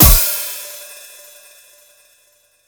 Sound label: hit, brass